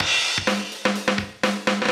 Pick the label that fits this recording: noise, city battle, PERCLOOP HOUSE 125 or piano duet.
PERCLOOP HOUSE 125